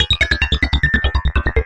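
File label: evil electro babble, 143 bpm; SYNTH AND ELECTRONIC LOOPS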